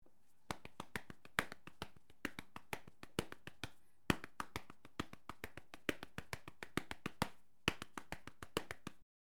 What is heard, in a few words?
Polyrhythm MAIN RHYTHM short; Jamuary